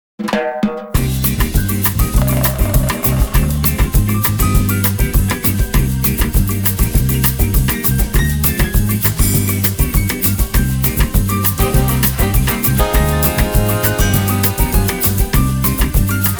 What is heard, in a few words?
Big Band, Samba